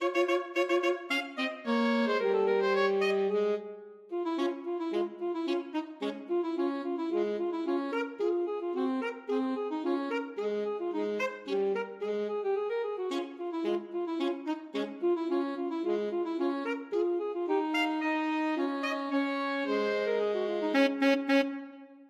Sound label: march, alto saxophone duet